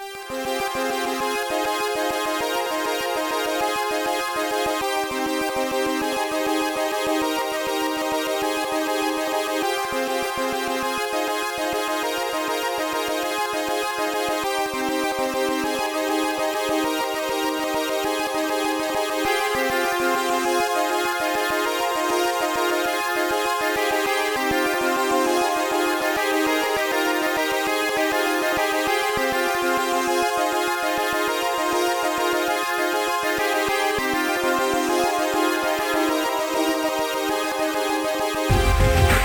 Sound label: trance power; Demonoid Productions